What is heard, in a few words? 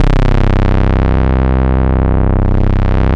BS MINI B, Synth Bass, MIDI Bass; Roland - Rhythm Section